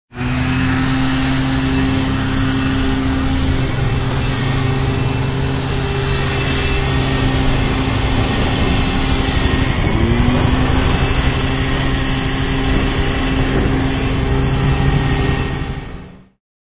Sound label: Industrial weed whacker whacks.; Weed Whacker Sound Effects; Machines